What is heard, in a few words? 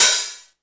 HH, Drum & Bass, 135 BPM; Ueberschall; Drum & Bass Flash Trackz